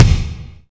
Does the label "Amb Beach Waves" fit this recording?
No